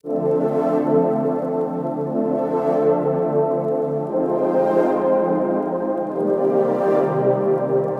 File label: 120 C deep cuts, Music Loops; Touch Loops; BlissedOutBeats